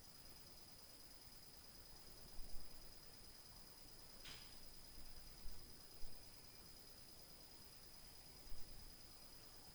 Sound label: TAK NOISE In Main Room, Night Noise